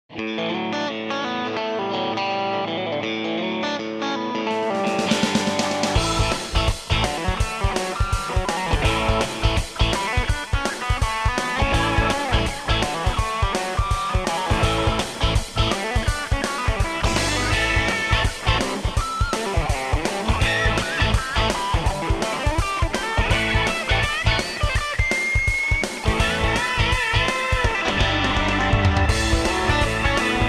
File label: hard rock